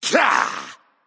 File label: grunt attack claw; smite; voicepack; set